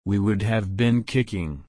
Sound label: kick